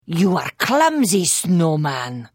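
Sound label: Attack, Khajiit female; The Elder Scrolls III - Morrowind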